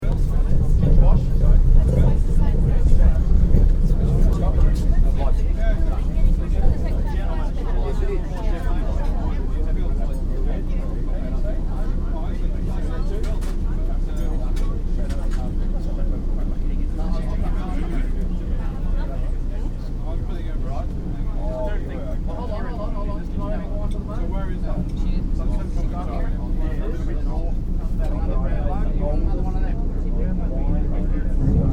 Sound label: Ambience Tramcar Restaurant Dinner Crowd, Colonial tramcar resteraunt interior; Restaurant Sound Effects; Foundation1